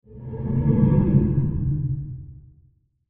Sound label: Sci Fi Spaceship